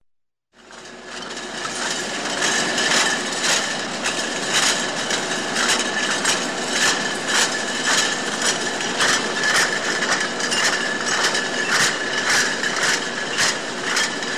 likero-vodochnij zavod butilki na linii rozliva

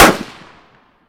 odinochnij vistrel v tire po misheni

